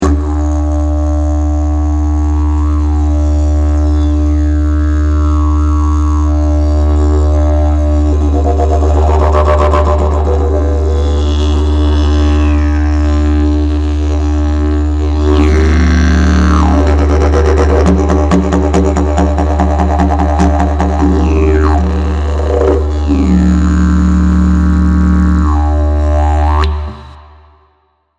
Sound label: didge